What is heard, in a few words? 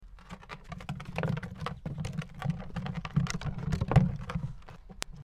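barrel sounds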